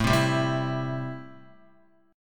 A-Minor 7th A Guitar; Am7 strummed; JGuitar

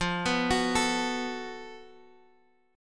Gb Minor Major 7th Double Flat 5th F

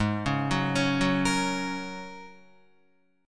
Ab 9th Suspended 4th Ab down Guitar; Ab9sus4 arpeggiated; JGuitar

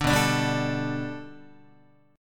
B-Minor 7th Sharp 5th B down Guitar; Bm7#5 strummed; 5,7,5,5,6,8; JGuitar

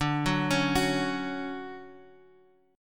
D-Major 7th D down Guitar Half Step Down

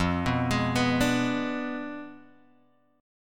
F Diminished 7th F down Guitar Open F; Fdim7 arpeggiated; JGuitar

